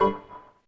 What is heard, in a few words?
error; Microsoft Money 2007